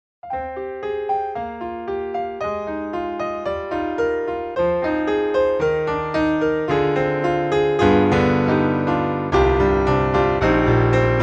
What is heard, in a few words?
grand Close, piano